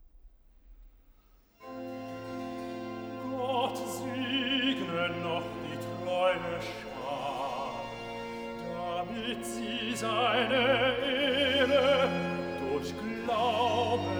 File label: Cantata, Recitativo (Bass); BWV 76 'Die Himmel erzählen die Ehre Gottes'; Bach; Paris; 2nd Sun After Trinity